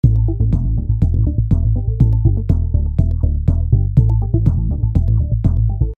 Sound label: Music